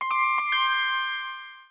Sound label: electric piano; sound-icons